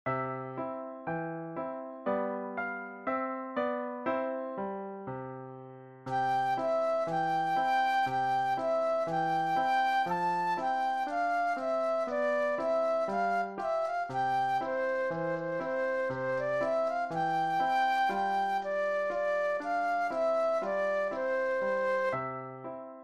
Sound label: flute solo